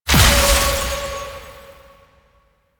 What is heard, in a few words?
Bleeding Shards Impact; Warframe; Citrine